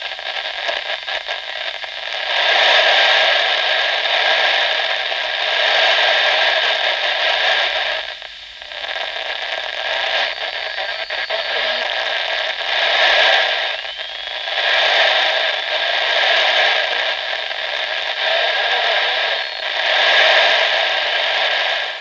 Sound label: biolab radio loop